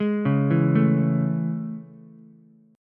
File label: A Major 7th A, Ukulele; AM7 arpeggiated; JGuitar